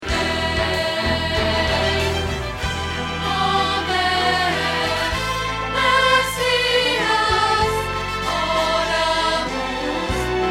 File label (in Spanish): Contralto